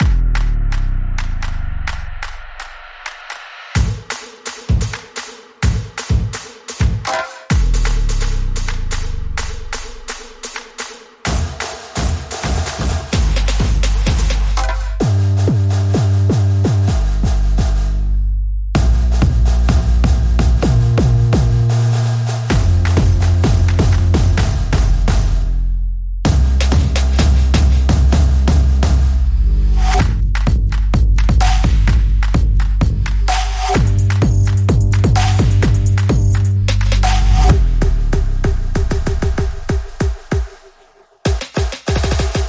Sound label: Drums Only